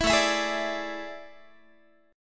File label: A Major 7th Suspended 4th, Guitar; AM7sus4; strummed; 19,17,19,18,18,x